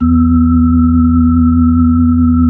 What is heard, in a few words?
B-3, Brite